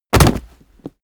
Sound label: body fall concrete leather rumble; nahkampf; soundpool